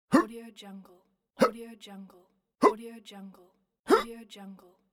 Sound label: man jumping